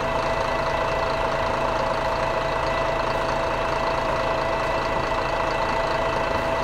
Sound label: pushback, Effects; A320